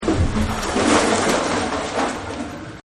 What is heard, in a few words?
Wood Pile; Sound Effects:Materials